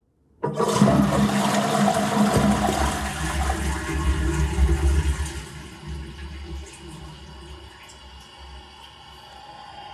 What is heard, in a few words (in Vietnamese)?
Tieng xa nuoc bon cau